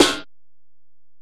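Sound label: Acoustic Snare, Alesis DM-PRO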